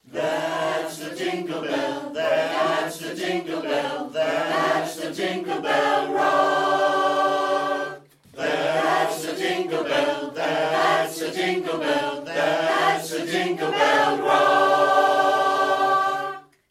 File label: acapella